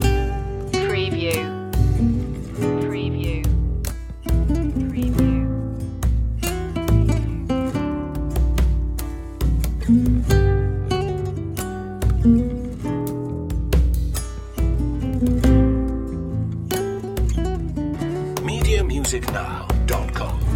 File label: latino loop